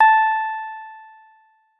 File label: piano syn